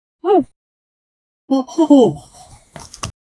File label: aliens